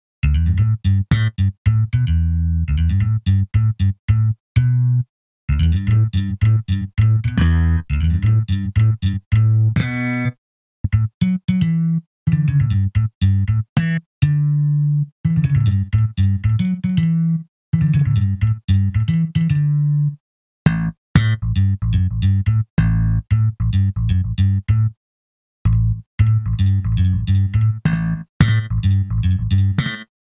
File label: Alesis Midiverb2 Delay 35 55 75ms